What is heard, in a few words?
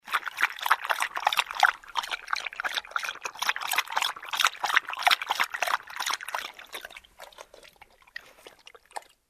dog drinking water